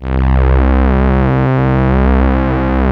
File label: PAD C2; Best Service Dream Experience; MATRIX NEW